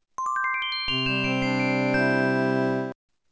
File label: Alert; Samsung GT-C6620